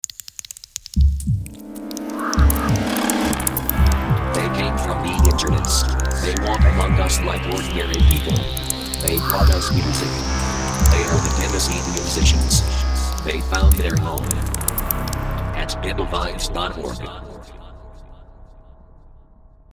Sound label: SciFi Jingle